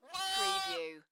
Lamb bleating; beanstalk audio

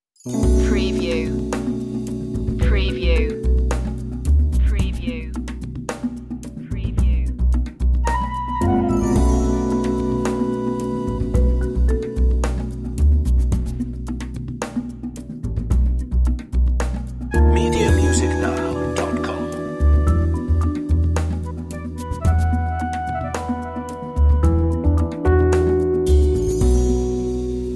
life style tv light comedy

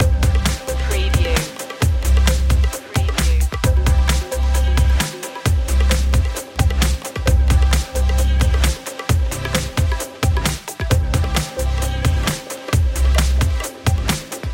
urban journey loop

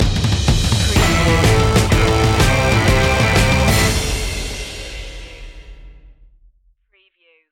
absynth stinger

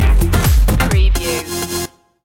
tribal drops stinger

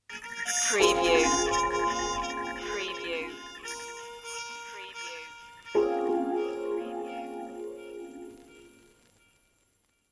stoner beat stinger

